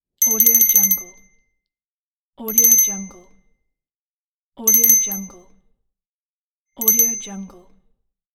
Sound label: small bell ringing